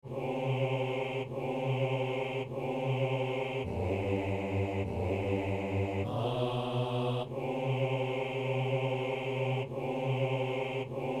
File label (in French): hommes